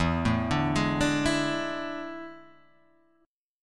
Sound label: A Major 7th Suspended 4th E